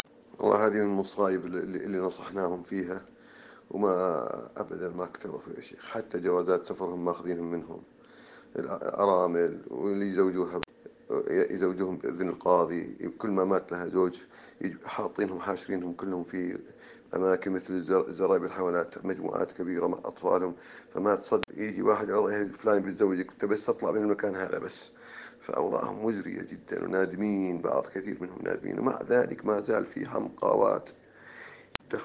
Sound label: sax